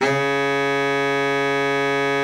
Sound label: BARITON, Baritone sax; Best Service; Killer Horns